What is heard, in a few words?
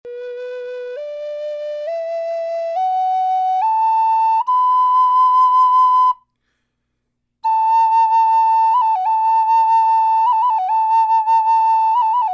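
natural, flute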